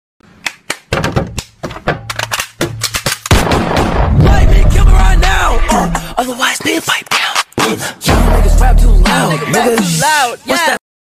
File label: transition; چالش